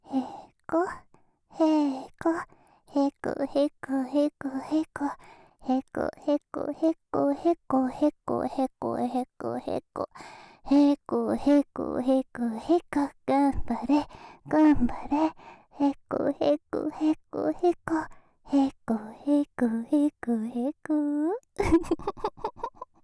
へこへこ

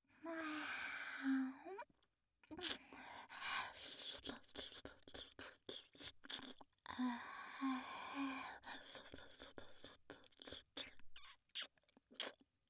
フェラチオ; SEあり

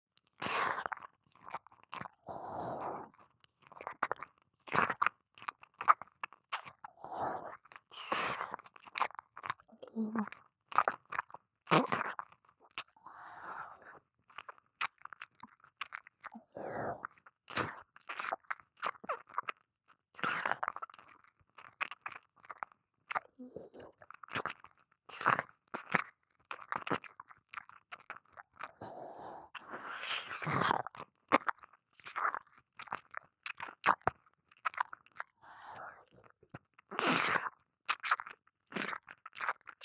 耳舐め

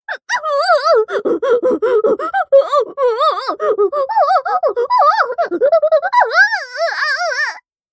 喘ぎオホ最大

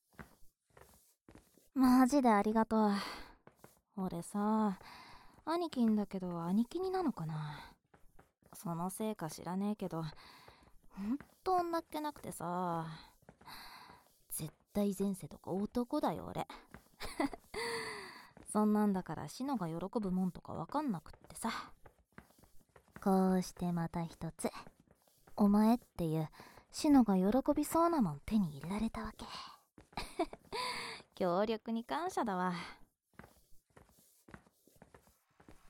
耳かき, ASMR; 恋と内緒の耳かきレッスン

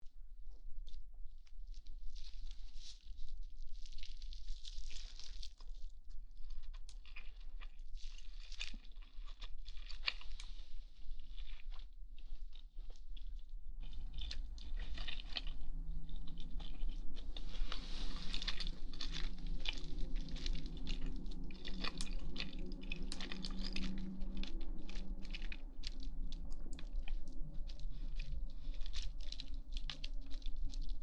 咀嚼音ASMR 恵方巻もぐもぐ

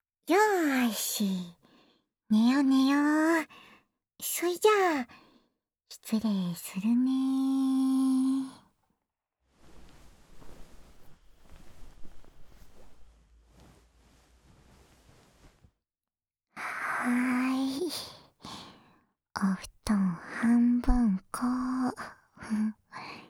子守歌＆オルゴールで寝よ寝よ, ASMR

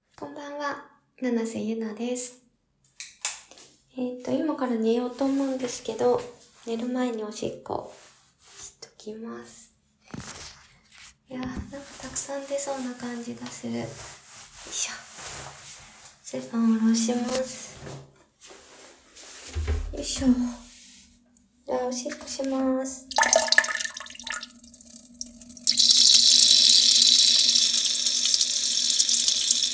寝る前の オシッコですッ

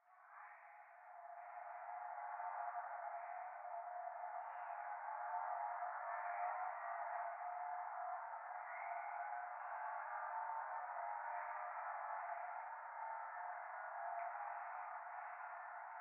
教会 右耳密着吐息 車の中

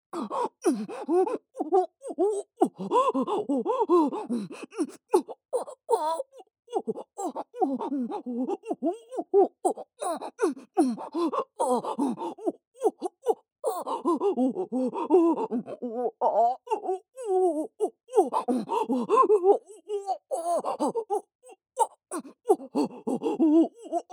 おほ声

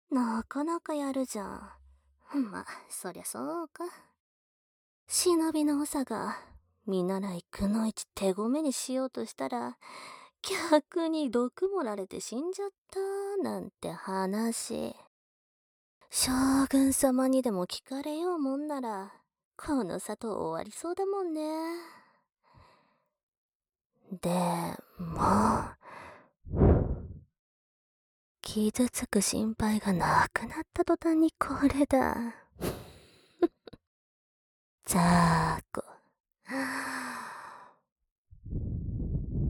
【右】耳ふー, 耳かき, ASMR

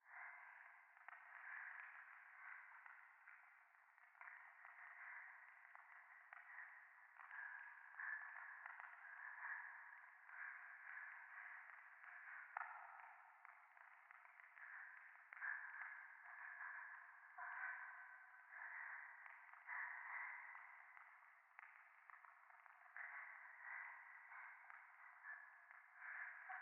右耳快感吐息 暖炉; 廃墟

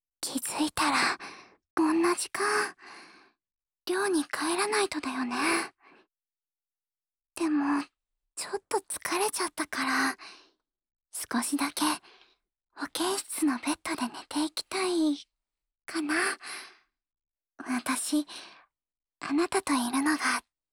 安眠ボイス＆寝息(左), ASMR

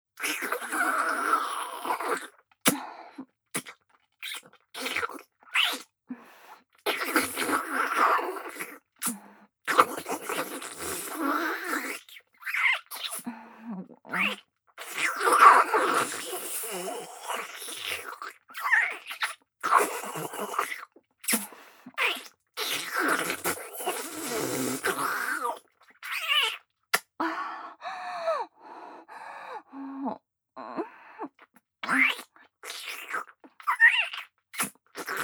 フェラ・激しい; マリエッタ

